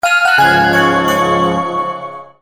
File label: bells; mvg